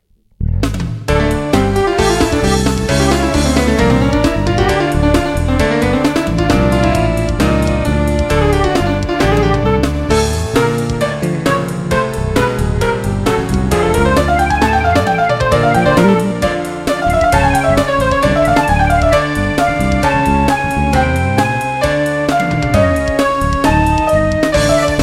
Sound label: jazz bass